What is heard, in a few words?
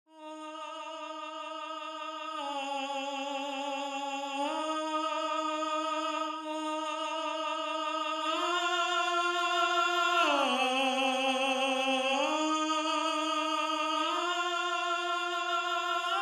Tenor, counterpoint